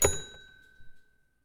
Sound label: dial open